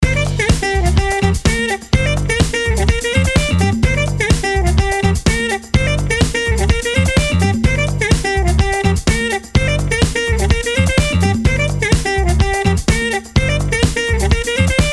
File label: Geinou Trap 126